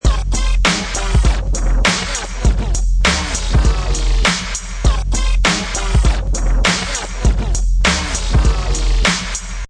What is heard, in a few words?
Scratch Hits beat 100, Vinyl Scratch Hits 100 bpm with beat, Professional killer vinyl scratch on top of hip hop beat; Dj Sound Effects